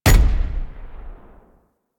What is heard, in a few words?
Flak Cannon Alt Fire Explosion; Warframe; UT Flak Cannon